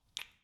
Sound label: Kiss On Cheek